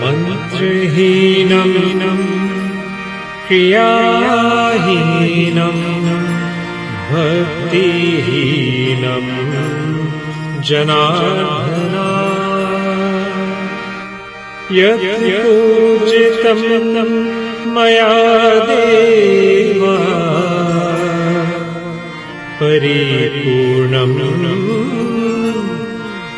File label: Mantra Heenam Kriya Heenam